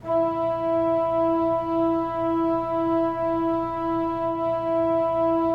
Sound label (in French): Orgue Eglise Strings E5, Pipe Organ Strings